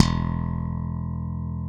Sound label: EBASS E, PICK BASS; AKAI S6000 CD-ROM - Volume 1